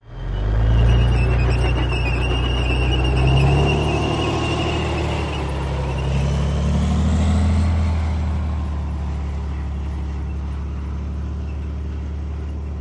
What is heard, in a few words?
M-60 Tank: Exterior Drive Away To Distant. Stereo